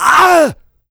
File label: pain large, player; huge